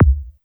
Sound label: HOUSE BD